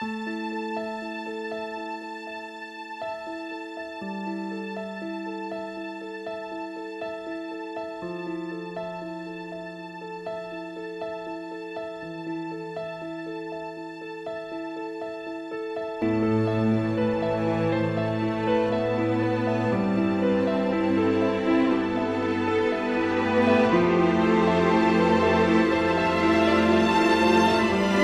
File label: piano, Loops Sad